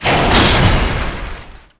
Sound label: Enemy Gear Fall